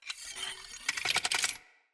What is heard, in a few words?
Bow Charge; Sinecure